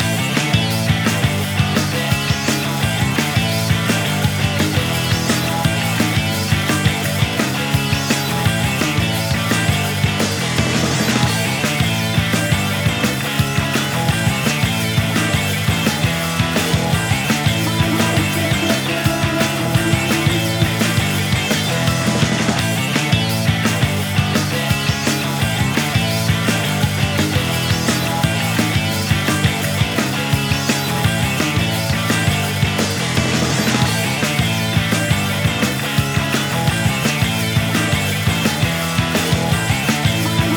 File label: Jam Session; Splattack